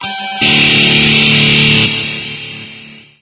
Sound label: Shred Guitar; Costume Select; Teenage Mutant Ninja Turtles Portal Power 2017